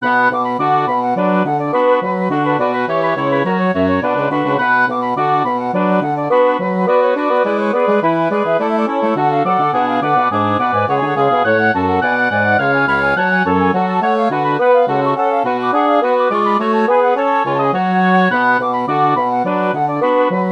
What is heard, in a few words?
for woodwind quartet; bach johann sebastian; badinerie from the orchestral suite no 2